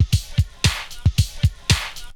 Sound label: Lo-Fi, Essential beats